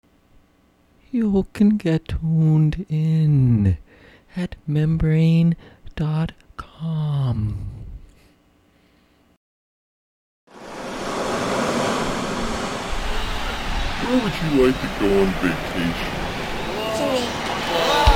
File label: rastafarian techno jig, live reggae